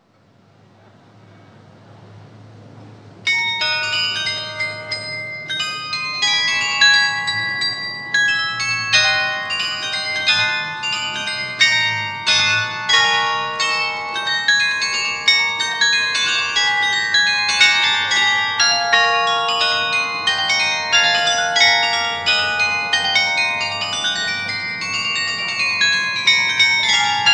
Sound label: carillon; Allerlei 2004-2005